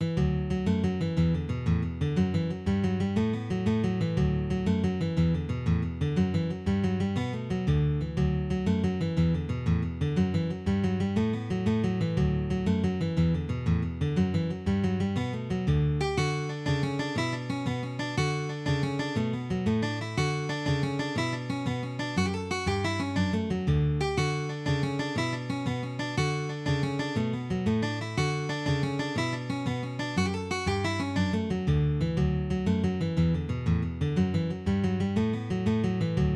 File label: Jig; Tonra's Jig